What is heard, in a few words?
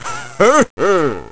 Man Hur Hur